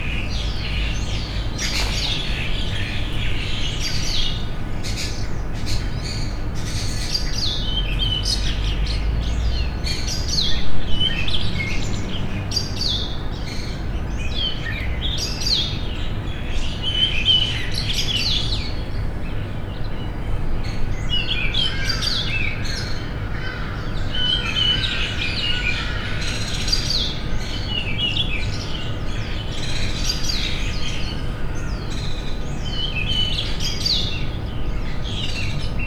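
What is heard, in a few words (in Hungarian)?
ébredő természet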